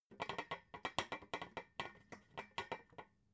metal scaffold pole rattle; industrie-wirtschaft

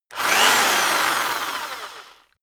rock drill rev medium medium

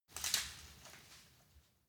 wood branch crack; berufe; foerster

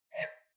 wine glass grab table slide medium; berufe; gastronomie; soundpool

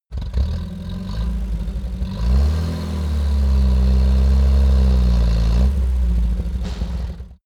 car uaz 469 driving gear change

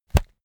toilet paper sheet tear off fast; haus-garten

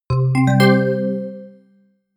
notification call

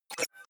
hover fast, signale; soundpool

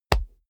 combat chest hit; krieg-waffen; nahkampf; soundpool

